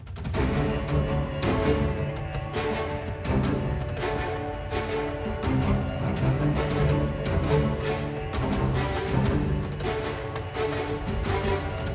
BGM combat mob enemy; Babylon's Fall

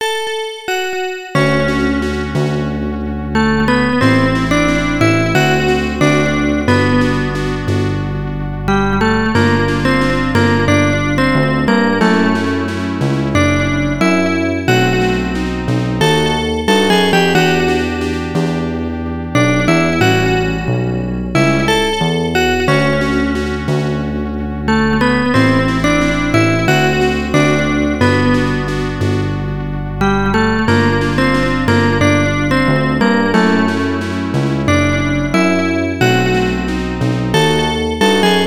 BGM; Cat's Part 1; X68000

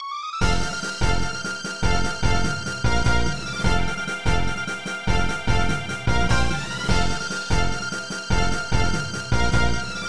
Deciding March; Custom Robo; N64 gamerip

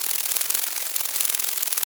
Warrior Ram Loop; we are the dwarves gamerip 2016

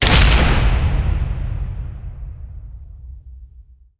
Lift Stop

